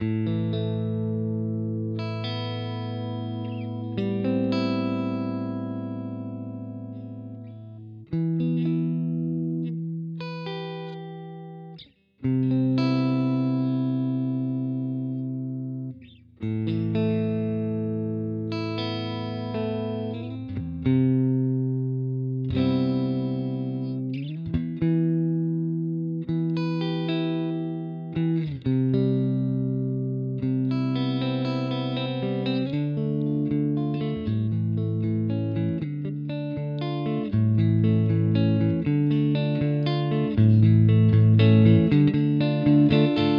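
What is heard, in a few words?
Hagstrom Ultra Max Special Neck Mid Split Clean, guitar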